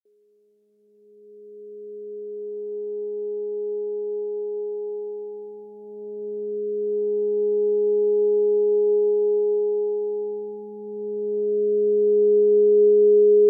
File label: 417Hz Energy Transformer